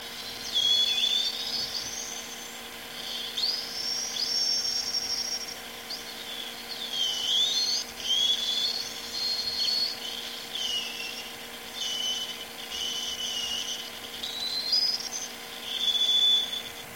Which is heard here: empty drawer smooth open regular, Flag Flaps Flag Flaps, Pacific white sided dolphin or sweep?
Pacific white sided dolphin